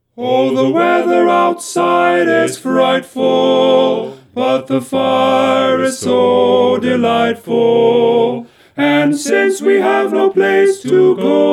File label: tenor predom